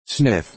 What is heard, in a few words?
sniff